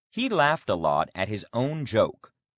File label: laugh; He laughed a lot at his own joke.